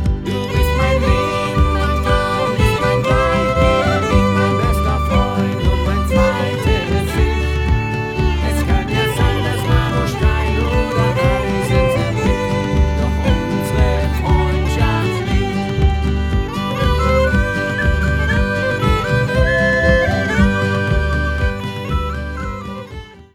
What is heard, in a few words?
german fiddle